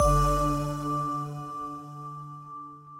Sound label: prize bonus; Great Book Of Magic Deluxe; Wazdan